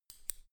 scissors cut

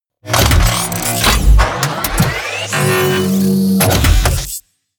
machine transformation

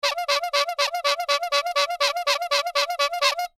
clown horn fast

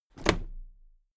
toolbox close drop lid heavy

